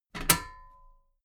metal ladder step heavy

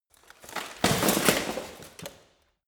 bodydrop

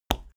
step wood step

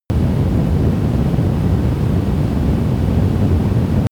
balloon fly fire steady

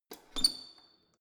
letterbox metal lock

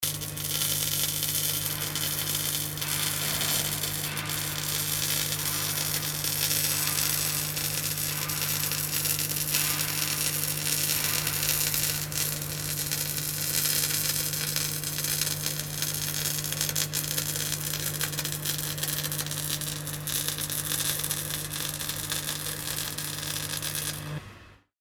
welding electrical

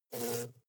hornet hum short hard

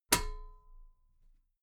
metal ladder step regular

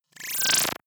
data transfer beam

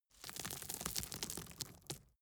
gravel drop

